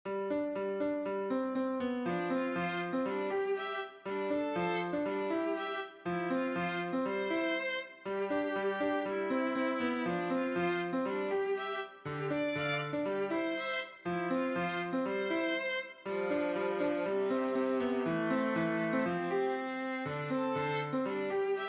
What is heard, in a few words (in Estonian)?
tsello; Bridgeton